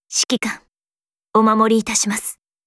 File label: applause; osu